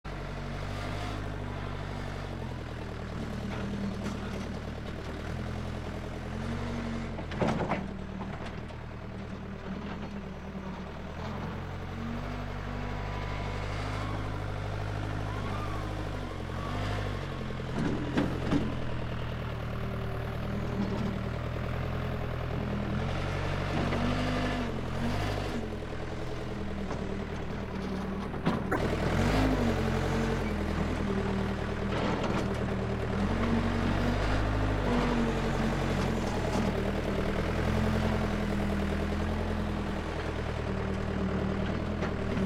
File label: Worksite; Big Room Sound